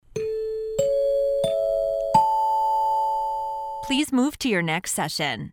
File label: four chimes ascending please move to your next session